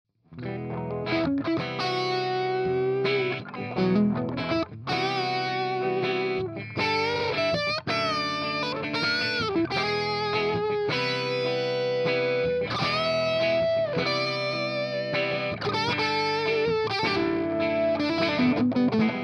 clean solo; Fryette

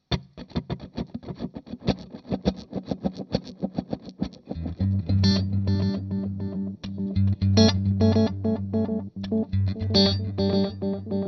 Analog FX Send

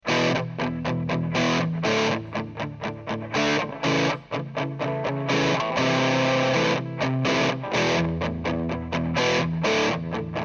clean ch; od250

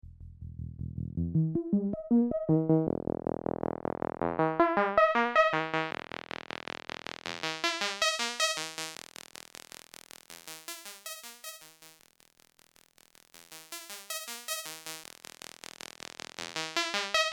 Lamda BP

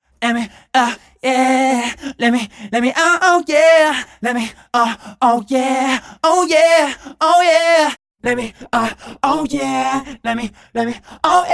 Voices

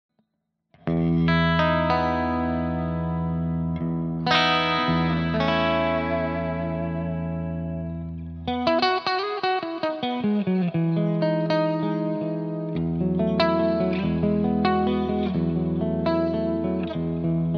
MUSIC MAN L3 clean 2nd position

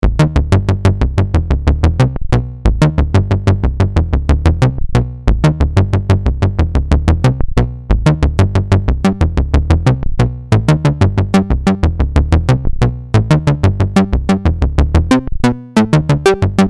ARPer Range Modulation

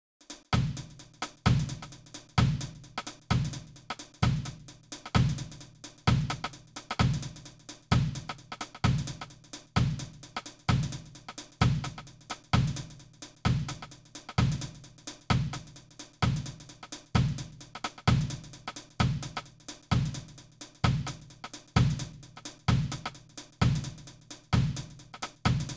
Reggae; MDrummer

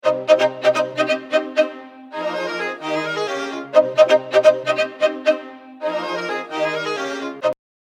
Violin original

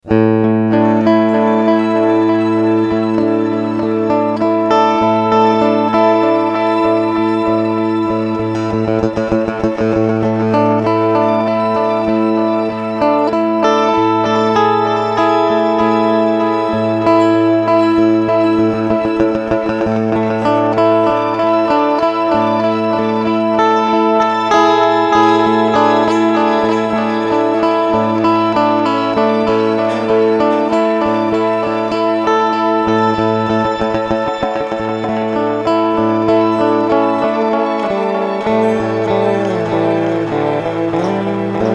Delay Shimmer

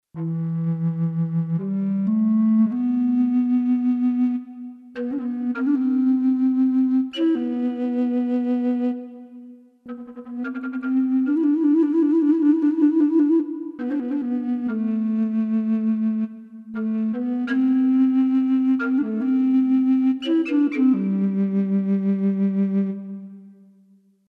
wind cedar native american flute contrabass

